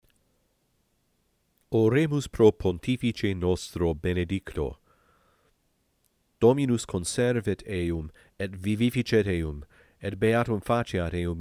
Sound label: pro Pontifice